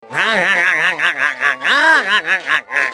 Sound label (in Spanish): risa; calamardo; ganga